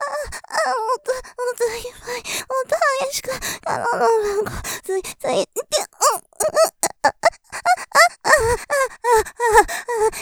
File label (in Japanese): 喘ぎ とろとろマシマシ; もっといっぱい、もっと激しく突いて！; おかずにスパイス２; 勢いマシマシ; モノラル